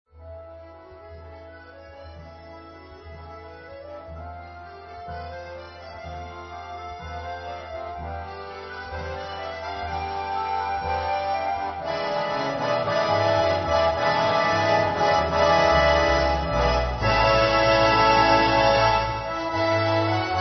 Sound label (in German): Konzert Orchester